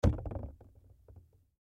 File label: Bottle Water Half, Bottle Water Half Empty Set Down; Set Downs; Objects; Blastwave; Foley